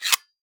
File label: war ui source metal staple gun slider snap closed; esmteam